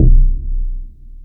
TRIBAL DRUM; Techno Trance; E-MU Formula 4000 Series Vol. 2

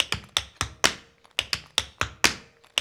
TAP, African Tap; Spectrasonics; Supreme Beats

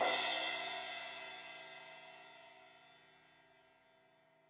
Crash 16in edge, Cymbals; Deeply Sampled Drums

